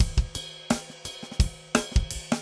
funk 7 4 170; odd loops

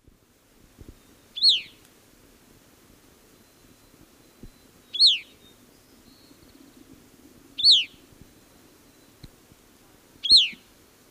Catharus minimus, calls; S. America; Eastern Lowlands

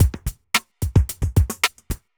evil beats

